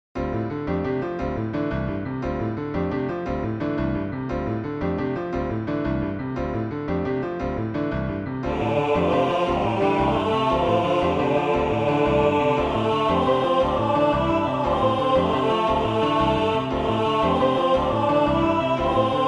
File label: Bass